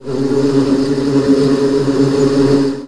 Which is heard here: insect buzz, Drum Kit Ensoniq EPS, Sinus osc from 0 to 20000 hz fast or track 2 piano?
insect buzz